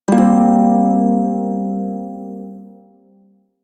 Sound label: Prime Shawzin Blues Chord K; Music; Warframe